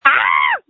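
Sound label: Scream, phone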